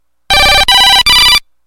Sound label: Old Nokia